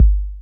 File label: K 808, KICKS TR 9+8